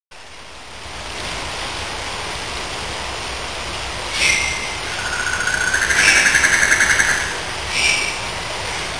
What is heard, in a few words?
rainforest ambience